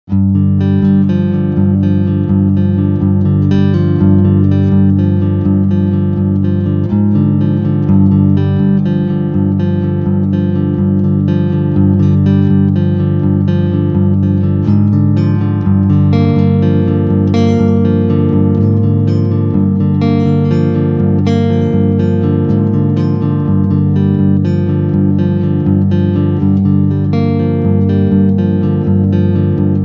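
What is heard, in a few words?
Clean Picking Front singlecoil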